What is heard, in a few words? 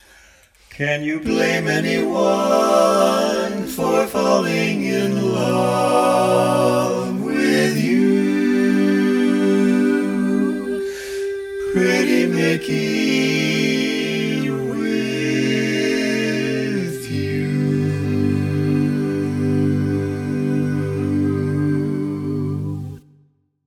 All Parts, barbershop tags